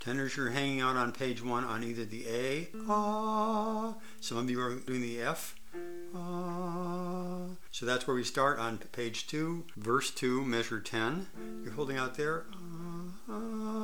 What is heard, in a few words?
Dumai tenor